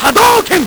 LSDj, Nintendo Gameboy; 300 Drum Machines

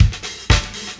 live beat techno; Sonic Foundry (Sony Creative Software); Ugly Remnants VOL-2

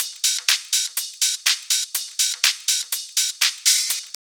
house loop

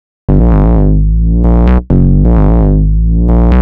Revival Glide, Bass; Sonic Foundry (Sony Creative Software); Underground UK House & Electro

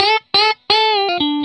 RIFF 120, Vintage Blues Guitar

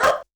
Doggie, Sony DRP-2

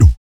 VOC PERC, Vocal Planet Jazz FX BASS PERC

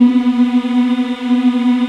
VOX AFMM, VOX Afro Mms, Africa

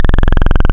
Sub Phat OD Saw, wavetables; Animoog

